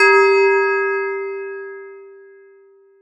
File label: bell G4; osu